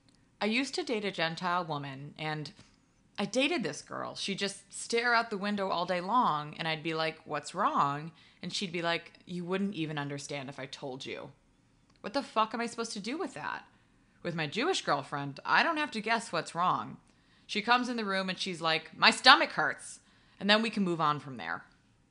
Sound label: Laugh Track; Joke